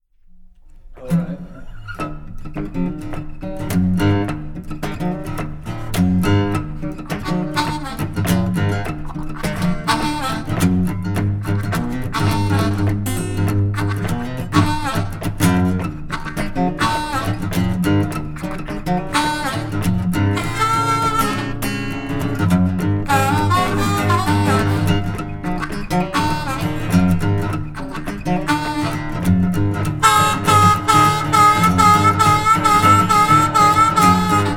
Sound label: Get Away Blues